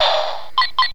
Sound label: HIT